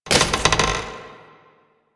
Tower Branch Movement; Warframe; Quests; TheNewWar; Ambience; Cetus; Unum Tower

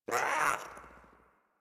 Catbrow Pet Speak; Warframe; Pet Ship

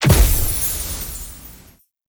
Magik Explo Rune Hit; Powers; Runes

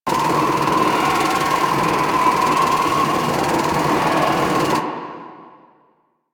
Dark Loop; Warframe; Harlequin

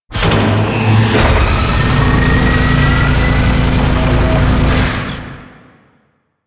Dropship Doors Open; Warframe; The New War; Sealab

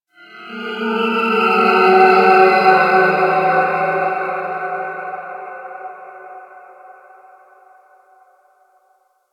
Space Exterior Dist Call, Space Battles, Ambience

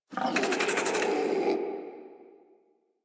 Infested Charger Idle; Warframe

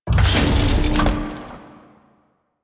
Door Large Close; Ambience; Warframe; The New War; Quests; Zariman

